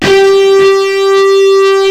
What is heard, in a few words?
keytar; jazz jackrabbit 2